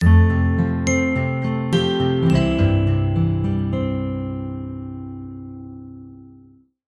multimedia signature ident warm sincere piano glock guitar picked bass; zapsplat